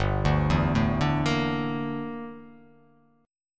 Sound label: G Minor 6th Add 9th G down